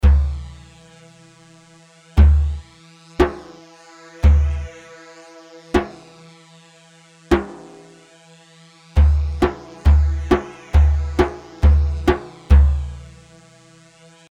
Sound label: Merjaf; Merjaf 5 مرجاف